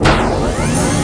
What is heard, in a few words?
crane; Star Trek demo; Star Trek Audio